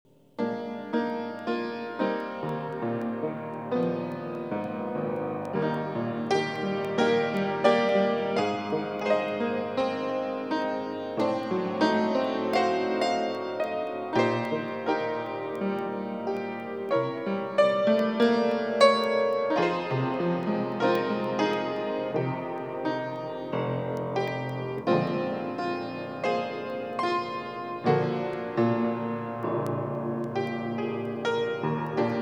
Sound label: Piano high